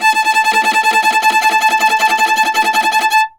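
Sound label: viola tremolo A4; Orchestral; DirectWave; Varazdin Orchestral; Viola II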